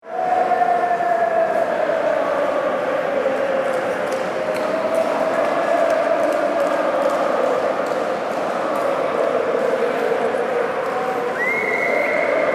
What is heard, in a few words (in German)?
Fans im Bahnhof